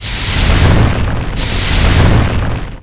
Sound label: fireloop; RYL